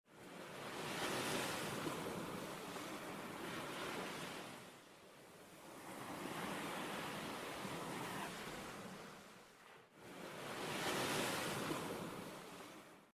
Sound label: waves crashing